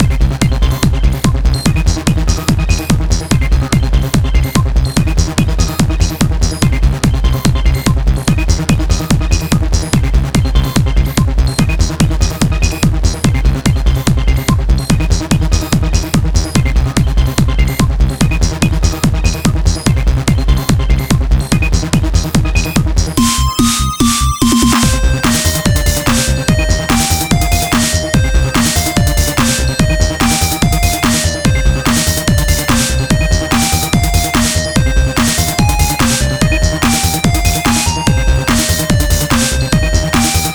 GAMEPLAY THEME LOOP; Spacecats with Lasers

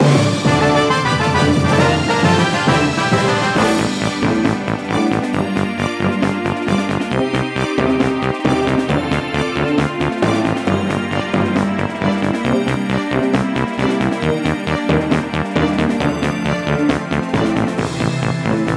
Leave Port Fanfare; Steel Diver

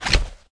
Footsteps; SFX Character

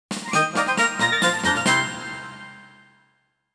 Fanfare Level Up

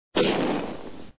Barrier hit; Wario Gets Straight

